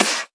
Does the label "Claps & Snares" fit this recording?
Yes